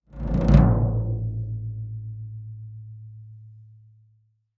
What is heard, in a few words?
Drums Toms C Low Shrt, drums tom